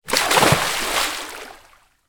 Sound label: water splash child jump into pool somersault; zapsplat